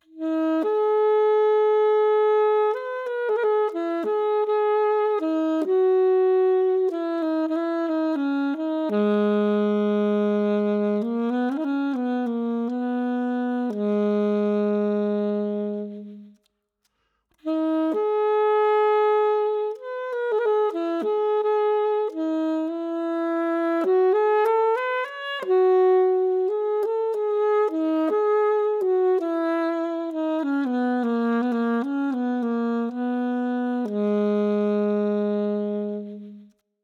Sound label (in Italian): ALTO, sax; timbri